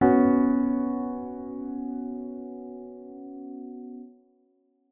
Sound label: sus2 triad blocked b